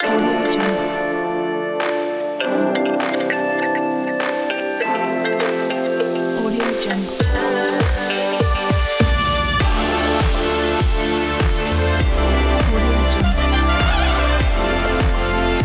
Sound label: brand soundtrack logo